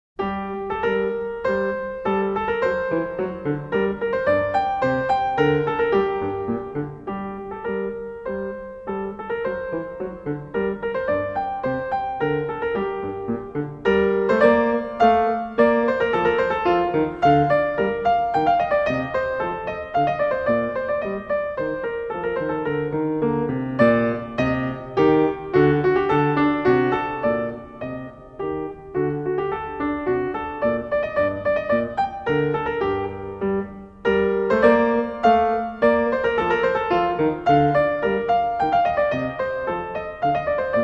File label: Polonaise In G Minor; Magrath Book 04